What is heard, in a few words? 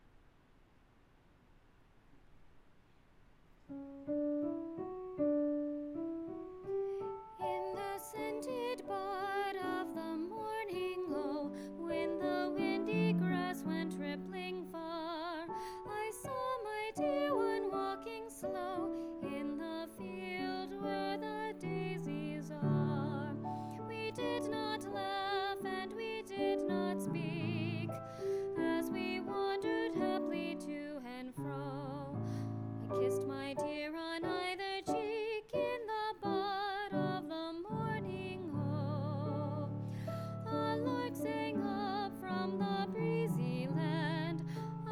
low vocal